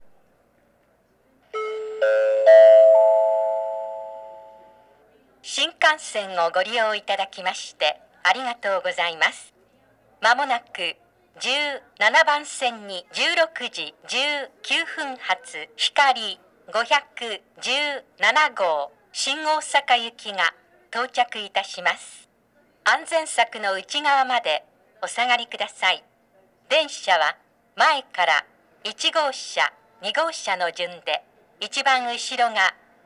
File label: nagoya s hikari shin osaka; station; jr-central